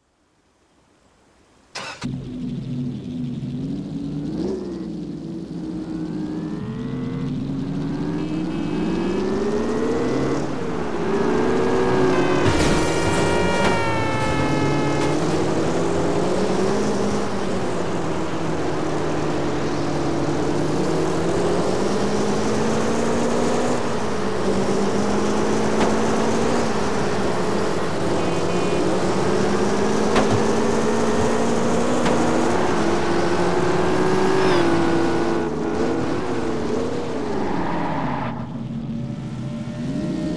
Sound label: caterham csr 260